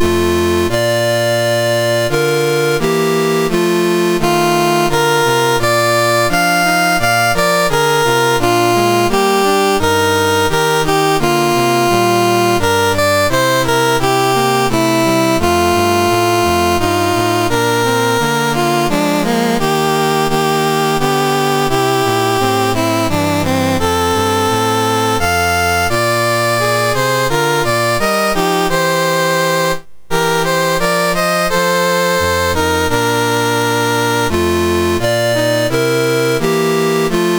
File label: MOS6581R3, National Anthems; Saint Kitts and Nevis